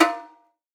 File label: Bullet Impact Metal; Cruelty Squad missing unused soundtracks